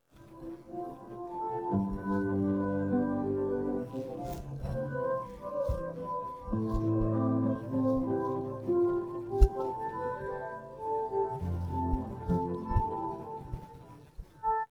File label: no vocals